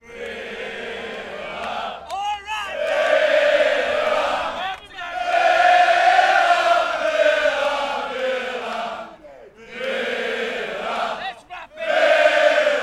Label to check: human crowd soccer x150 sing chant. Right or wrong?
Right